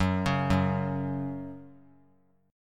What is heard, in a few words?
F sharp 5th F sharp; arpeggiated; JGuitar